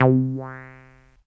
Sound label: Jupiter4 Plucky Res Vinyl Synths C2